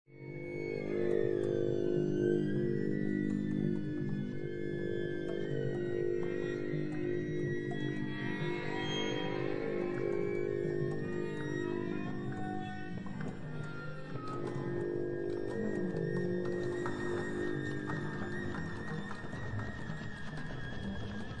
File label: slap